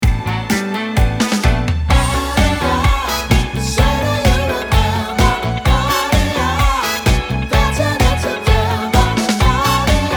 A mit PIano

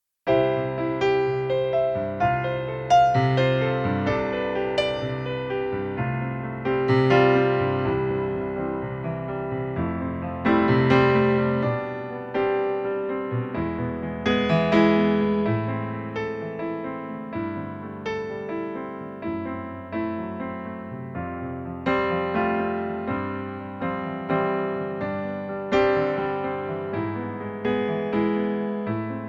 Pianoplayback C Dur

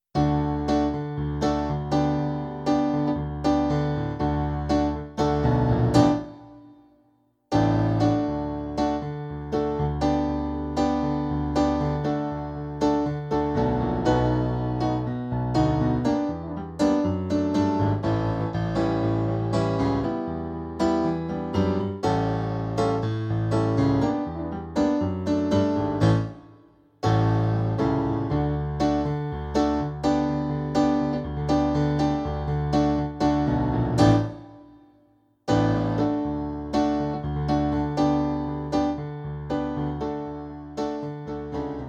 pianoplayback; traditionell

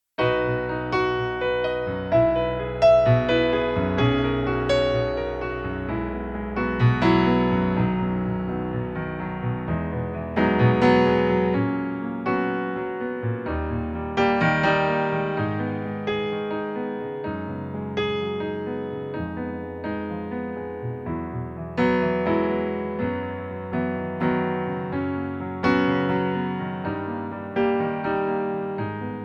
Pianoplayback H-Dur

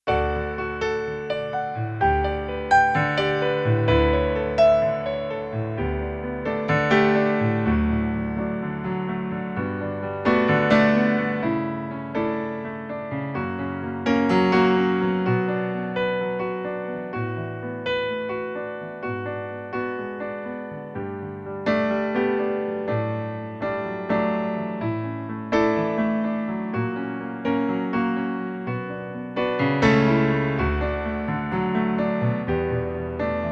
pianoplayback D Dur

